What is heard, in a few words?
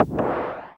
sweep; Ralli